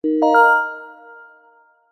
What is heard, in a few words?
multimedia notification digital bell warm generic